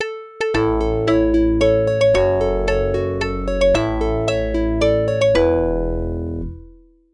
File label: Mines Sting